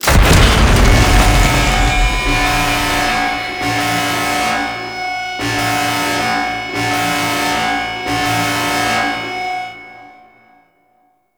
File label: Defense Start Wave; Warframe; Jade Shadows; Ascension